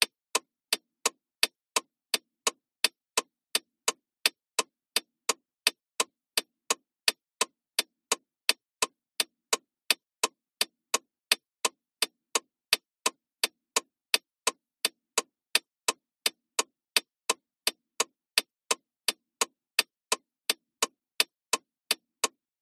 transport car hazard warning lights internal tick tock long